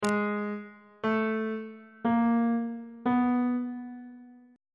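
a double sharp diminished 7th minor 7th major 7th augmented 7th intervals on bass clef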